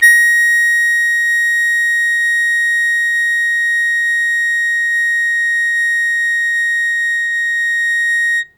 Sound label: Long Sustain Bb3, Harmonica; Zero G - Harmonica